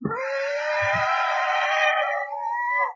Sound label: snarl, monster